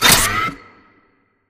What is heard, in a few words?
Fence Flip Start; Titanfall 2; Boomtown